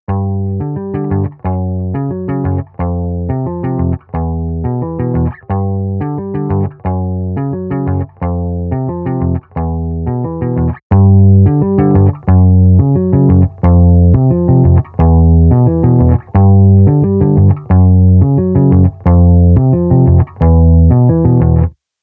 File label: clean boost, pedals; bass bully